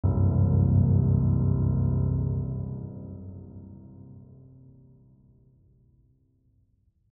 Hush, Lullay, low chord

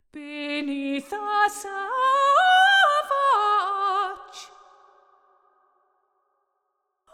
Hush, Lullay, vocal phrase

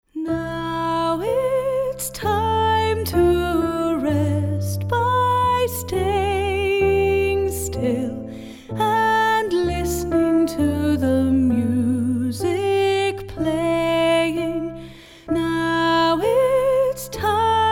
Time to rest; Music-makers

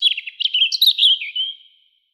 robin

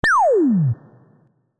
slide down

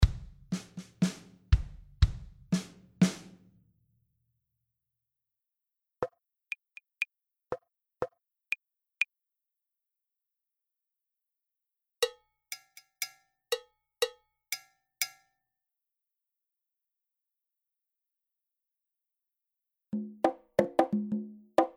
Rhythmic motifs, timbres and dynamics changed

